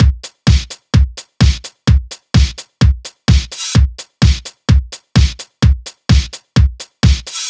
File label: Housebeats, 128BPM; Vengeance Samples; VEH2 Loops